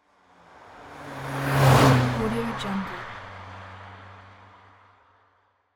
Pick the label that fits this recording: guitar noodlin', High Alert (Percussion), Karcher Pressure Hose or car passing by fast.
car passing by fast